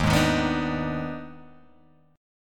Eb Major 7th Suspended 4th Sharp 5th Eb, Guitar, Open G